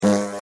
Hornet, Attack, Land, Fly, Wings, Buzz, Insect, Bug; Blastwave; Animals:Insects